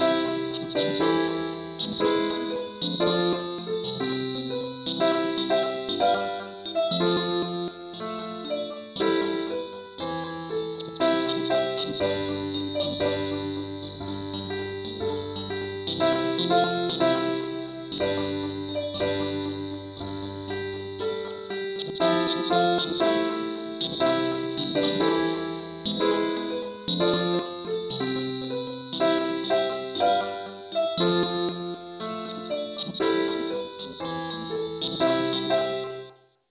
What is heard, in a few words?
ukelele key c